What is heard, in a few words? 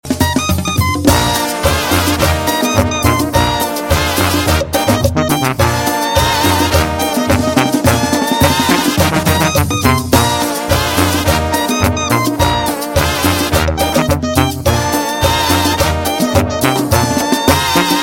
cumbia ringtone